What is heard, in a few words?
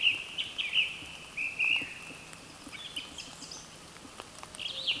Turdus philomelos